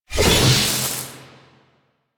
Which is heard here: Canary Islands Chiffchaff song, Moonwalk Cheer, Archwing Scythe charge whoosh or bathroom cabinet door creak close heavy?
Archwing Scythe charge whoosh